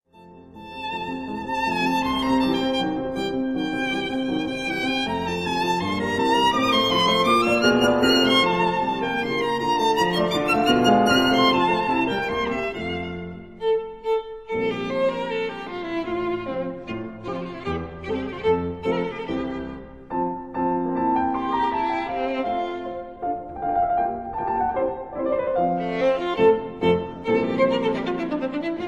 Sonata for violin & piano in F major, Rondo Allegro; No. 5; Spring; Op. 24